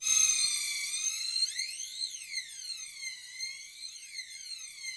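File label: Rough Strings C1, Yamaha VP-1; C6; 90 s Sample CDs; Music Store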